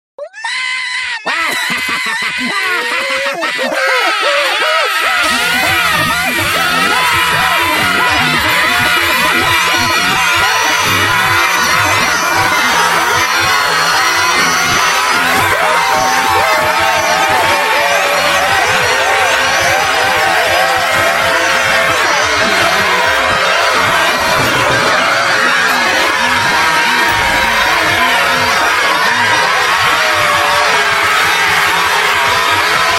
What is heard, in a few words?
screaming